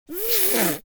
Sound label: human vocal little girl snort